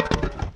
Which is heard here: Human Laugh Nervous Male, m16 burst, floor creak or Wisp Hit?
Wisp Hit